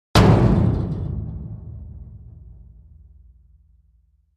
Industrial Waste Removal Bin Hit with Sledge Hammer